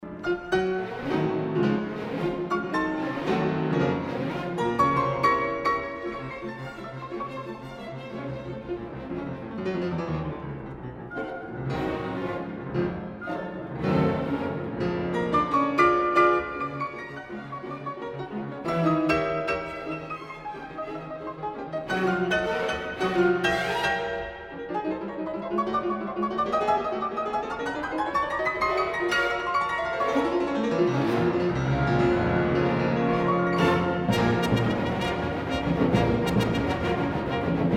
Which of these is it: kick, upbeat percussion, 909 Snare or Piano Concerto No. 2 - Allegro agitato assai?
Piano Concerto No. 2 - Allegro agitato assai